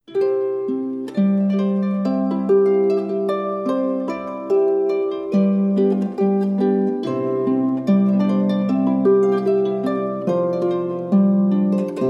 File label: Trumpet Voluntary